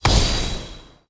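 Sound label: Pillar green spawn, monster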